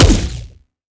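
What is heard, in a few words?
Sci weapon Shot Small Blaster Anti Matter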